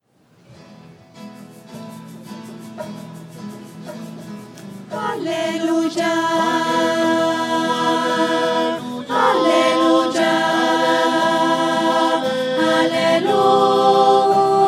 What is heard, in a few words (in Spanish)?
voces; aleluya en mi; misa